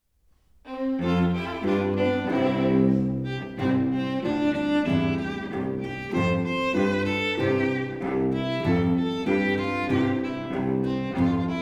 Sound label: tôn gron instrumental music